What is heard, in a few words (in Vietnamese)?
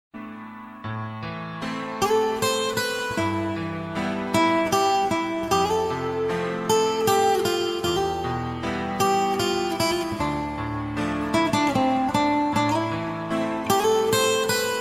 nhac chuong guitar